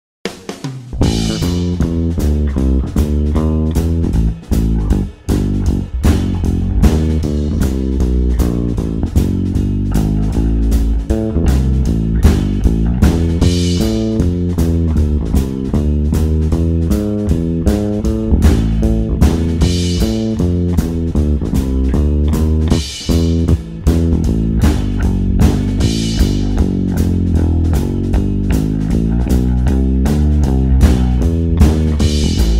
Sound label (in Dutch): opname basgitaar